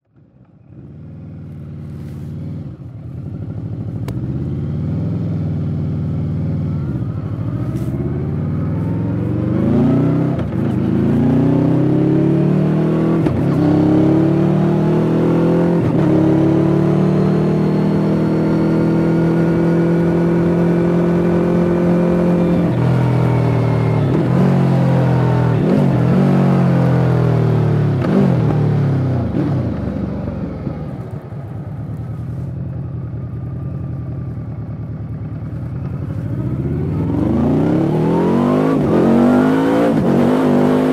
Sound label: shifting, Vmax; 新型VMAX; 排気音; シフトアップ